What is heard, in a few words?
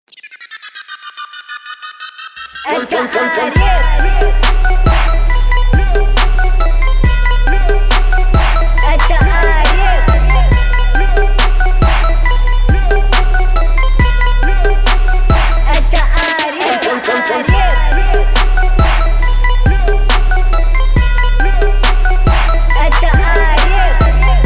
Beat